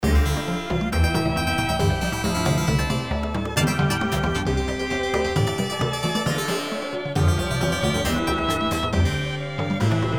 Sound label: drum